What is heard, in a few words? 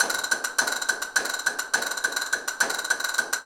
Castanets, Flamenco